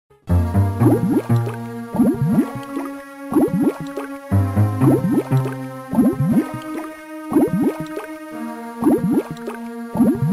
Bubbles; Lego Island 2 The Brickster's Revenge GBA 2001

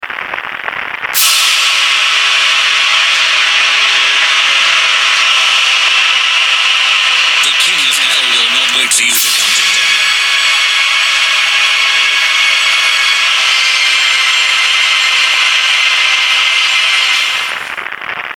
gramophone; Necrovision; Easteregg

